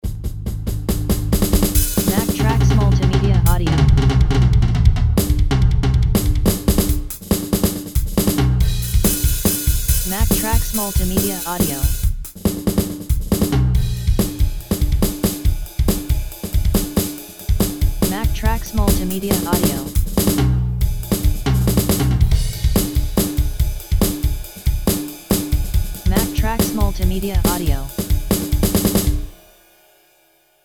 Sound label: drumkit compress